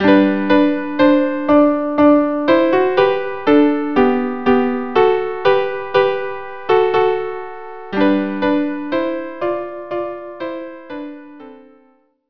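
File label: unaccompanied violin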